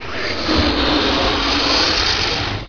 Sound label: blow; BESTSHOT; noisybit